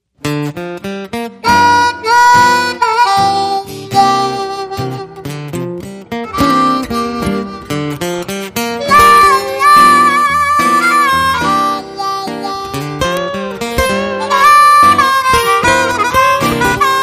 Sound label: Slow Blues